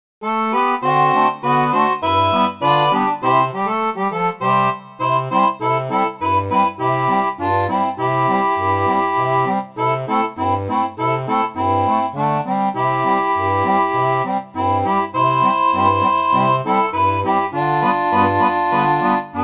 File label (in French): orgue barbarie